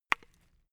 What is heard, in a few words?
wood stick hit stone forest